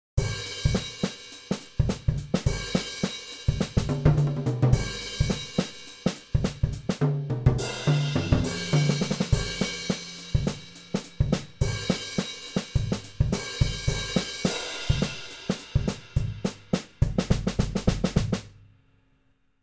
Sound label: Quite dry and groovy, drum-sets; All these examples without on-board effects or room added.; Natural Drumsets